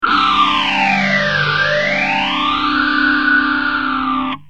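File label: guitar tc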